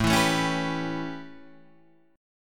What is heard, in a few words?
A 9th A, Guitar Open D